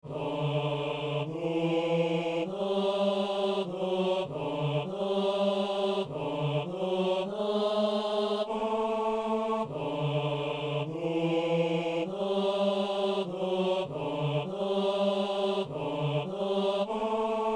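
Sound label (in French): alleluia, ténor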